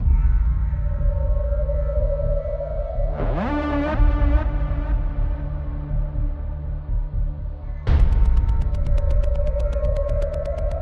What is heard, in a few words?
Suspense; mystery